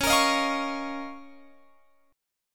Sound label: C sharp 6th Add 9th, Guitar, Open F; C#6add9; strummed; tuning=Open F; guitar chord